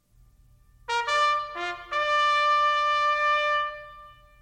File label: Attention Bugle